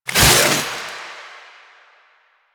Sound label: Stab Out; Finisher Parazon; Warframe; Kuva Lich; Finishers